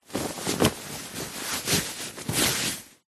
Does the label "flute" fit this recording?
No